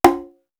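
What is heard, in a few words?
dynamic A, drum kit; East West; Global Sounds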